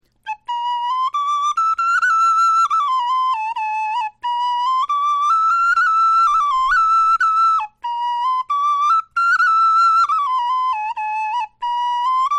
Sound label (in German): melodie, knochenfloeten